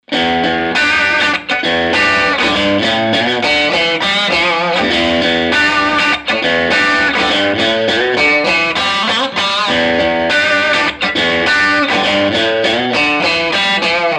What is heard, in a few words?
crunch bridge, Strat; schwarzbach; VP-Strat-66